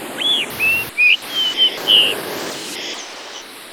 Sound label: six birds